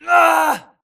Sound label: grunt death quick; Titanfall 2